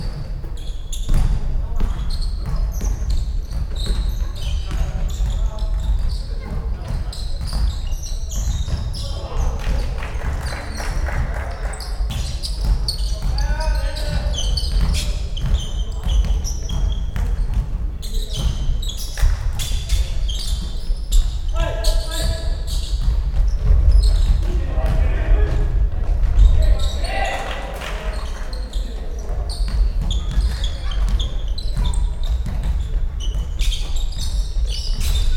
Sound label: men basketball